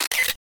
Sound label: camera shutter